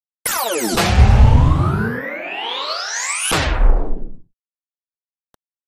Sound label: Sweep It Up